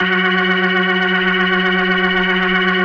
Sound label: F#3F, B3, Vintage Keyboards